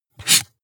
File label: marker mark hard short